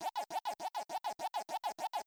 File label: movement lp; dota; crownfall